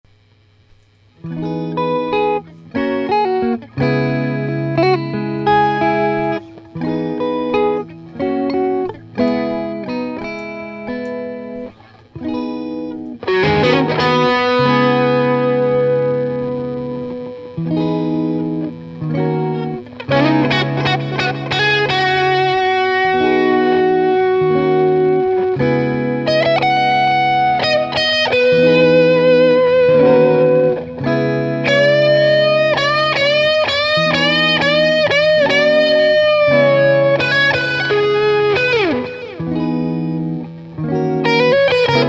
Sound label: Guitar Sample Track